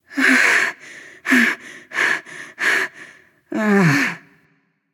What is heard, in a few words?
nv breath; smite; awilix